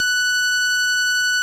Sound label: VOX F#5, VOX CONTINTL, Vintage Keyboards; Big Fish Audio; First Call Vintage Keyboards